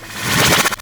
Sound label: Roulette Deactivate; Cowgirl